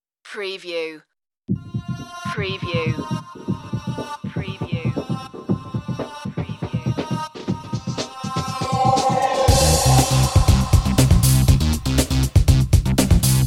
retro electro loop

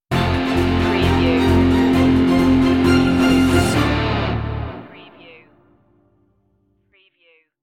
alive stinger

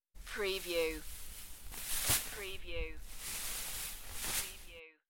Christmas tinsel garland; beanstalk audio

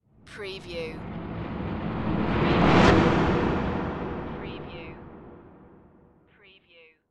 Transformation Swell

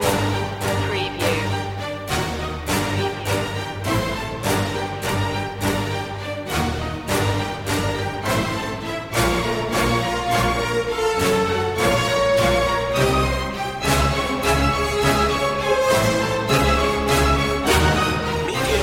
no choir

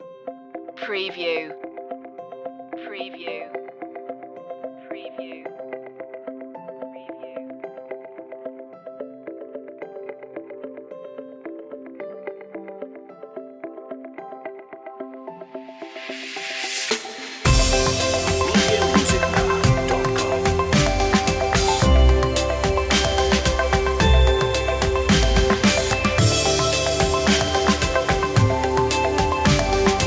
that feeling no vocals

